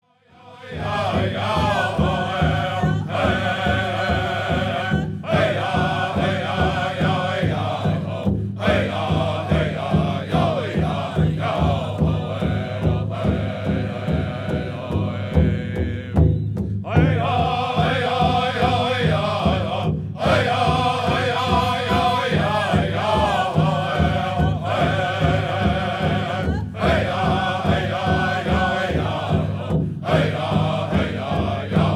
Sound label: Intertribal